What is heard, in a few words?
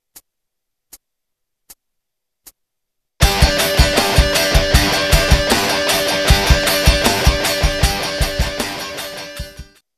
e scratch flag